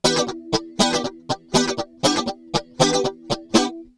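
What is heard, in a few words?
rhythm guitar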